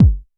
Electro Kit Kick

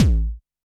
Electro Kit Kick

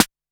Snr, Electro Kit

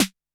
Snr, Electro Kit

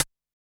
Elec Pd Hat

